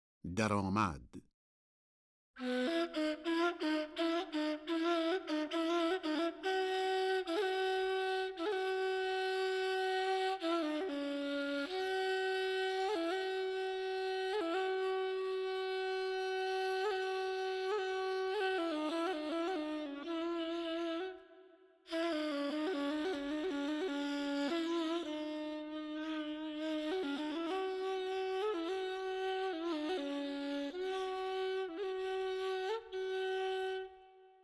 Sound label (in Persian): Daramad Nava Radif-e Ney, Dastgah Nava